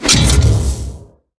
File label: warp; slender